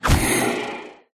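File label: pterodactyl boss block; pterodaktilj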